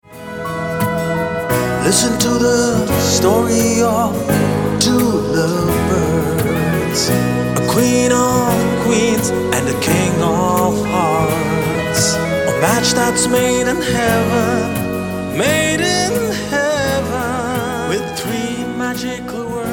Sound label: HAPPY LOVE DAY MALE ENGLISH